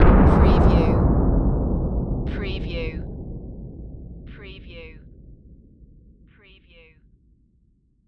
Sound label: EXPLOSION BLAST DYNAMITE; grenade; lynne-publishing